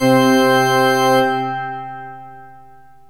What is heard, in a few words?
ORGAN; AMG Megabass REMIX! VOL-2